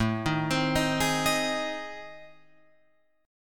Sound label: A Minor Major 7th Flat 5th, Guitar Open F; arpeggiated; AmM7b5; JGuitar